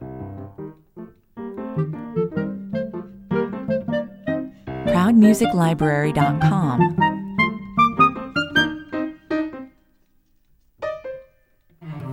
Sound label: playful 155 bpm